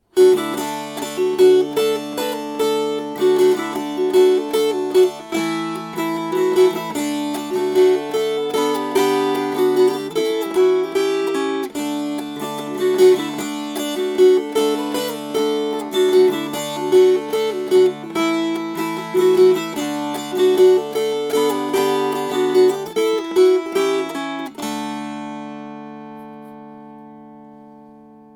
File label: Strummed